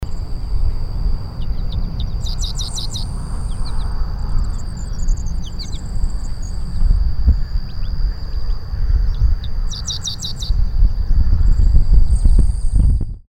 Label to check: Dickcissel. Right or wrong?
Right